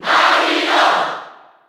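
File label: Cheer Japanese; Mario; SSB4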